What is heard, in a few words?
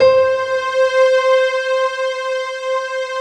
piano string; Sound & Vision; Gigapack I CD 2